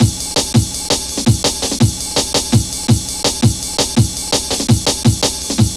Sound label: Harsh, Drum Loops 166.5; Zero-G Jungle Warfare 1, 2 & 3